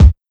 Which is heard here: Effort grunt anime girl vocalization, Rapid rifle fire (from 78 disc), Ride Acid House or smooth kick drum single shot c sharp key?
smooth kick drum single shot c sharp key